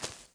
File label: dirt, footsteps